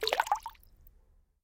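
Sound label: water stream soft short calm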